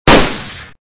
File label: smg; Gun Mayhem